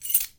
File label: Keys Stash